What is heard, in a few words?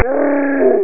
yelp; F1 Licenseware - Volume 1